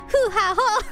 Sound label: HU HA HO